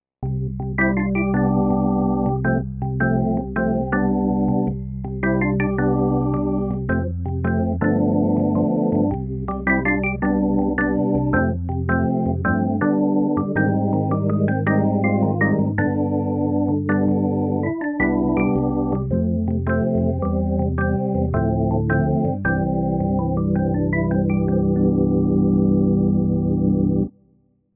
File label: jazz organ 1